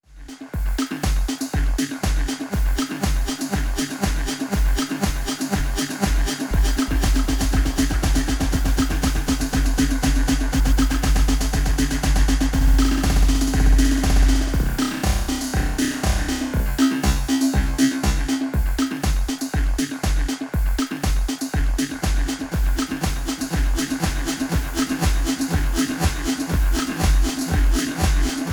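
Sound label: Reloop RMX 90 DVS Echo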